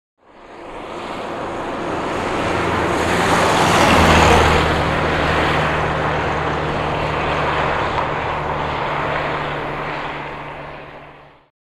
Automobile; By; Austin Ruby Up To Mic Changing Up Gears, Passes And Away.